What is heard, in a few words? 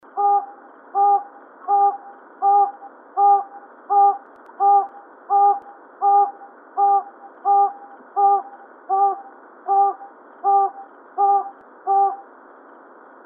solomons hawk owl